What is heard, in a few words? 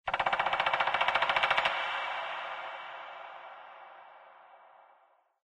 animal call woodpecker; Source Engine; tf; lair